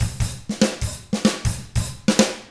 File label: 95 FUNK, Drums; ILIO; Double Platinum Drums 1